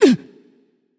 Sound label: grunt hit; smite; nuwa